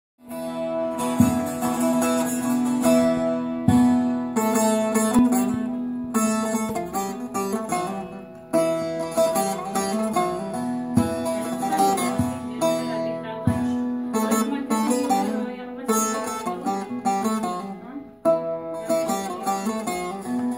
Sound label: padok